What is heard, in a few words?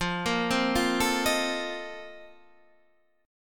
Bb Major 7th Suspended 2nd Suspended 4th